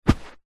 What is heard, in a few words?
footstep single foot in socks on carpet; zapsplat